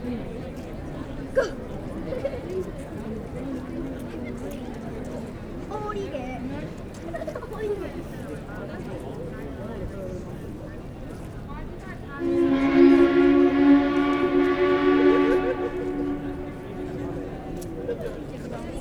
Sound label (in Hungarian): tömeg a hajóállomáson indulás előtti hajókürt